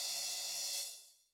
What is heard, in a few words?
Reverse, Cymbal, Yamaha DTXpress-II